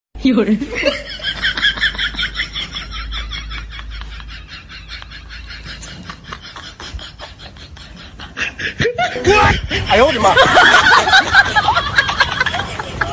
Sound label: laugh; breadradio